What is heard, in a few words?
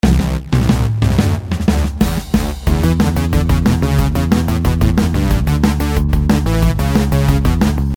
rapid beat